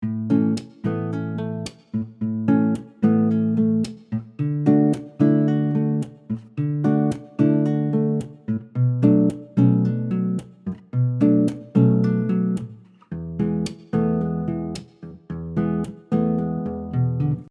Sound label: Percussive Pieces 110bpm, Soft Nylon Guitar; MG Soft Nylon Guitar - MIDI Pack